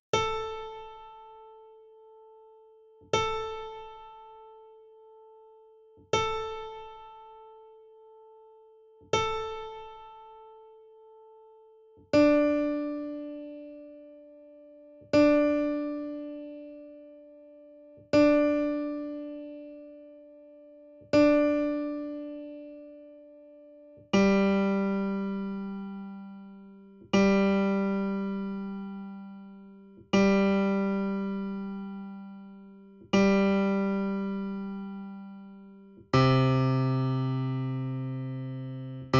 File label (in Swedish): stamtoner altfiol